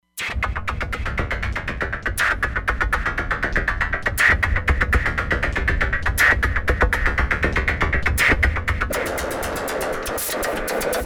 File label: Corrugated, Roland Fantom XR